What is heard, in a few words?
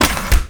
door vac; dystopia